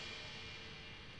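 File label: Crash Cymbals; CMI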